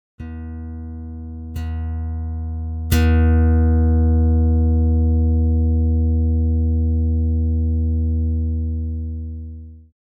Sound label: Pluck Amplitude; simulations; nemus-numerical-sound-restoration